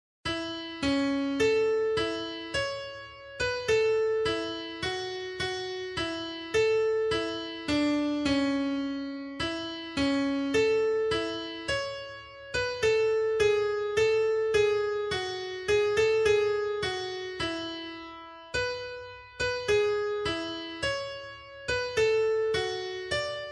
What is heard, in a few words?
REGENT SQUARE